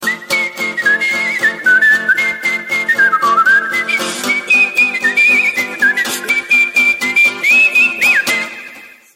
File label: Whistle Tone